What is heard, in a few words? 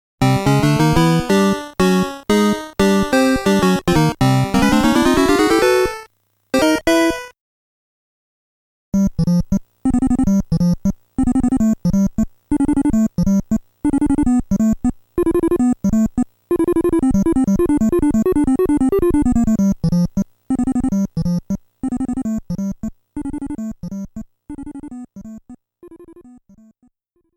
Game Start BGM